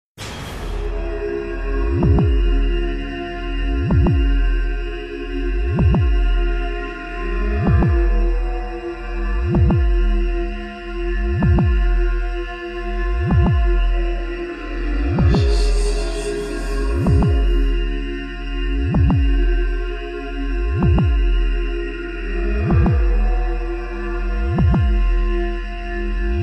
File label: Fear (AMBIENT); F.E.A.R. Perseus Mandate